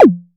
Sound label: SY D TOM; Crypton - DrumBase5000